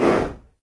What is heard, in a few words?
movement snort; sobek; smite